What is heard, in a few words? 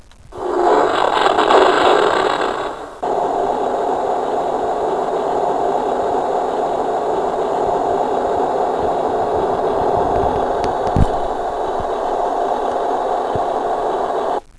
falcon Burst